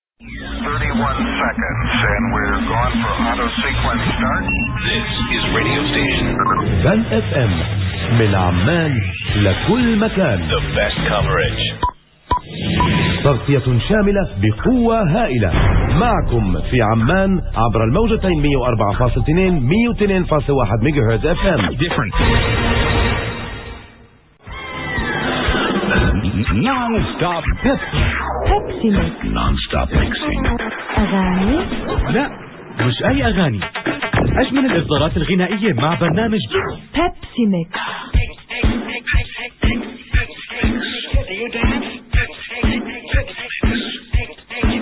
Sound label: interval signal; radio fann